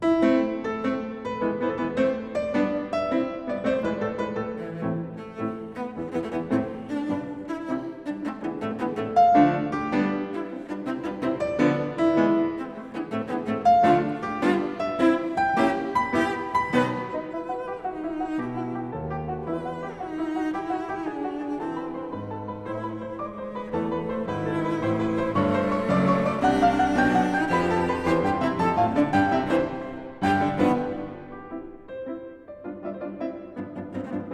Sonata for Cello and Piano No.3 in A, Scherzo (Allegro